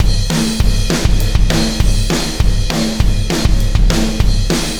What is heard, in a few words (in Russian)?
ударные и барабаны рок поп; Lust